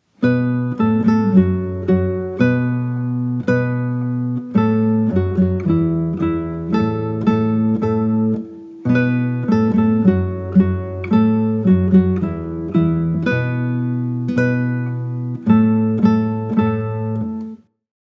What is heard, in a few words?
taiko Ton Ton; Oikina